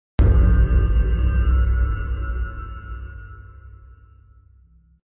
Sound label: spawn